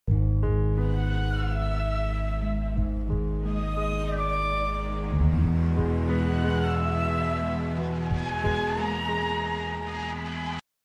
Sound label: Scary Tense